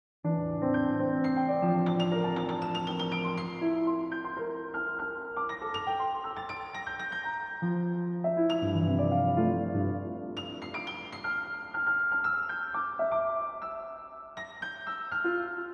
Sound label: forest music solo